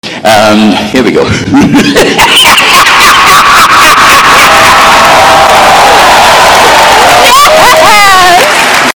evil laugh

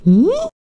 hmm

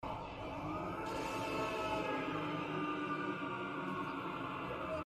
transform, scream; vegeta

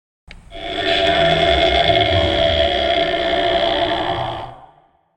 panic button

